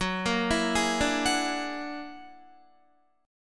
Gb Augmented 9th Gb down Guitar 4E,4A,5D,5G,5C,6F; Gb+9 arpeggiated

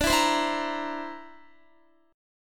D 7th Suspended 2nd Sharp 5th D, Guitar; D7sus2#5 strummed

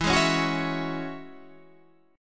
E 6th Add 9th E, Guitar Standard; E6add9; strummed; 12,11,11,11,14,12; down; capo=9

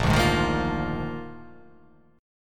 A sharp 11th

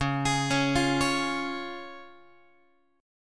Db Major Db Guitar; arpeggiated; JGuitar

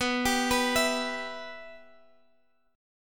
B Suspended 4th Sharp 5th B; Bsus4#5; arpeggiated; x,14,17,16,17,x

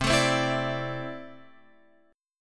C 6th Add 9th C down Guitar Open F; strummed; JGuitar

